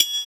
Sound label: Triangle; Equipped Music; Loungin House